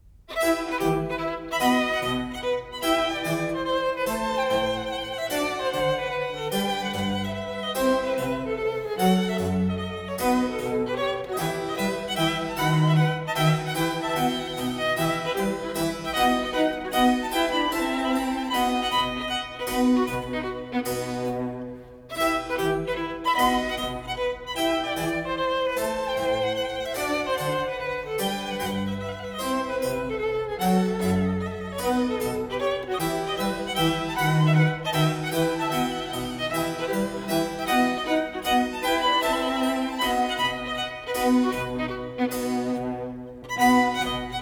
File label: Trio Sonata For 2 Violins And Basso Continuo No.2 in E minor; Vivaldi